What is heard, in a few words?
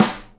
SNARE, Live Snares